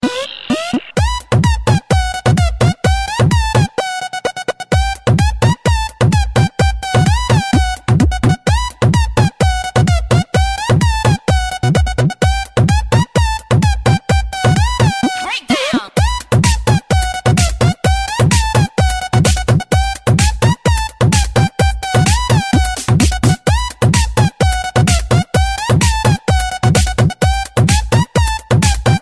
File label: Electro